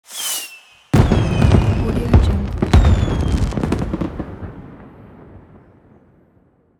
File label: firework; sfxroom